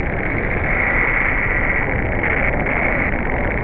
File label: data transmission loop; Half Life 2; Episode 1; citadel